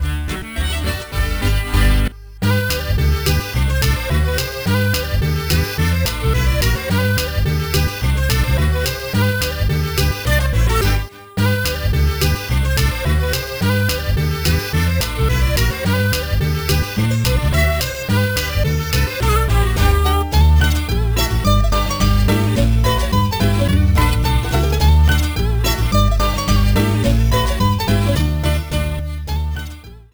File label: Ukulele